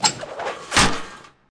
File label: rooster Throw; eggomatic